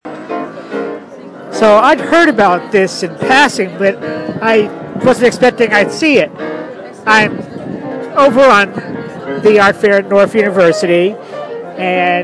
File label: piano bike